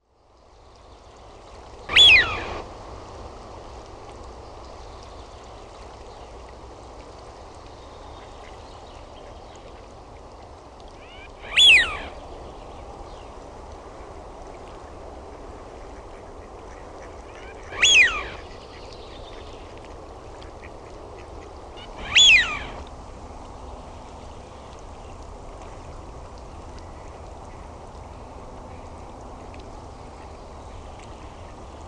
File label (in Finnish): HAAPANA; LINTUAANITYKSET